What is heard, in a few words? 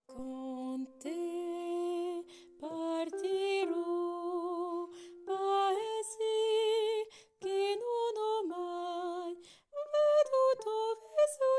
refrain 2 et fin soprane